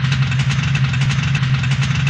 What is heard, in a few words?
snowmobile idle; veh snowmobile c22 idle